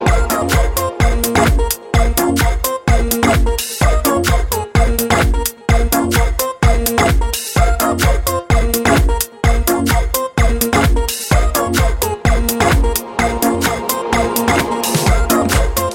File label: electro pyramid, ringtone